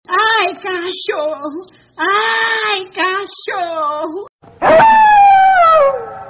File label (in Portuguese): ai cachorro com uivo